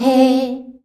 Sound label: "HEY" C, FEMALES